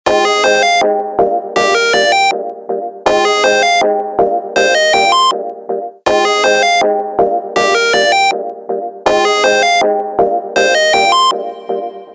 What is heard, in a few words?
Jazzy Phone, ringtone